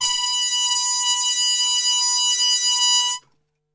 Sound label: B 3, Brass Super Section, TRP HARMUTE; Best Service; 90 s Sample CDs